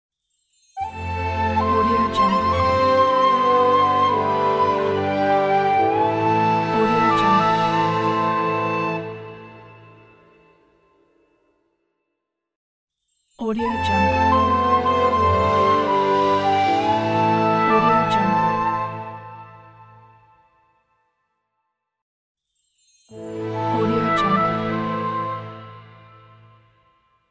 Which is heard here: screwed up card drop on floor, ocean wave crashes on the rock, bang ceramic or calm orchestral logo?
calm orchestral logo